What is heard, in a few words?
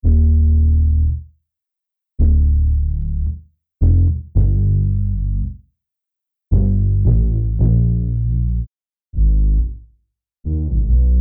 RB Bass